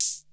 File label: KR33 CHH; Crypton - DrumBase5000